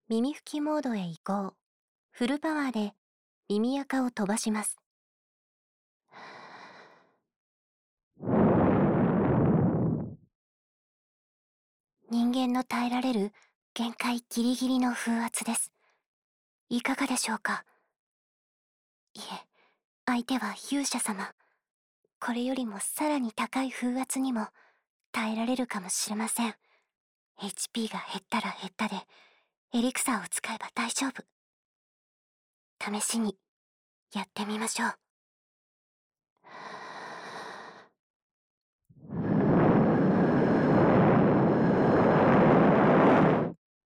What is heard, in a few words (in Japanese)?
耳吹き左; フルパワーで耳垢を飛ばします; 勇者